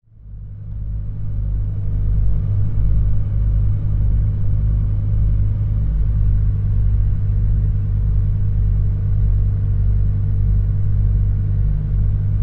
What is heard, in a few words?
Dodge Ram: On Board Slow Driving, Idle And Off. Mic Tail Pipe And Engine.